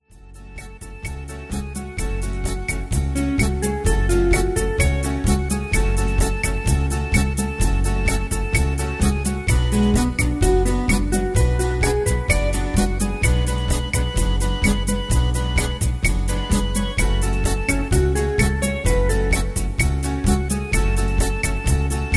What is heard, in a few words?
Rumba Patter